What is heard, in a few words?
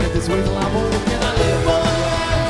live rock song